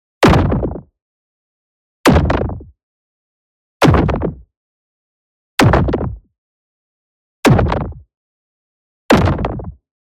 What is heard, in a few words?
EXPLOSION SMALL Scatter Impact; boom library; Matter